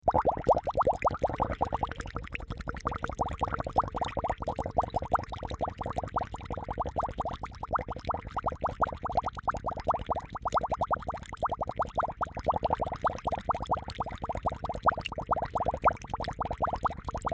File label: water bubble